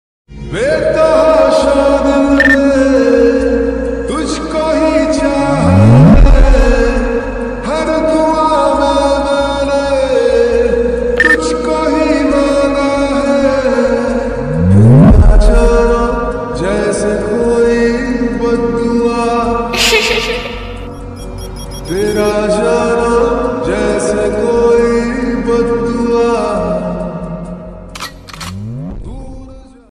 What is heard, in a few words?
ringtone love ringtone